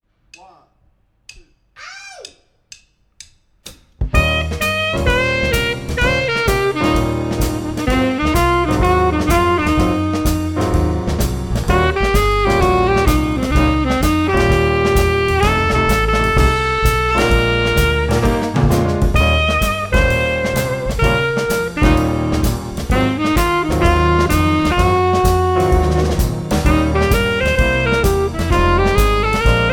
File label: Southern Shuffle